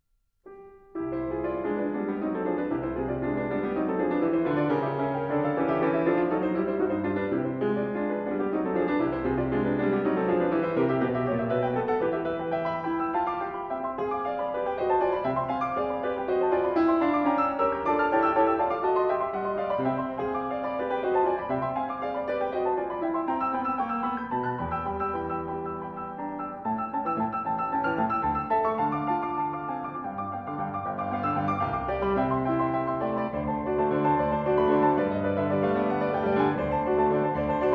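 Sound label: Etude op 10 No 7 in C Major Vivace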